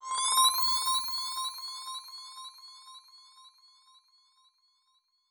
upwards tech sound

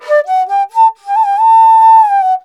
INDIA ALTO

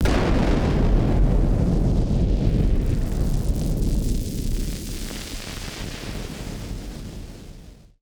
Party Popper FX

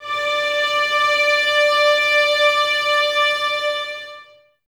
VNS D 5, Violins, String Ensembles; Miroslav Vitous

